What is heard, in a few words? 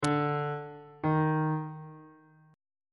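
e double flat perfect 1st augmented 1st intervals on bass clef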